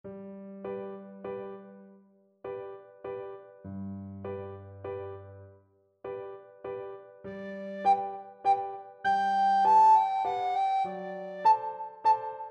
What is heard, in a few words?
saint saens elephant recorder